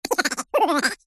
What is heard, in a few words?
cartoon laugh high pitched crazy bonkers mouse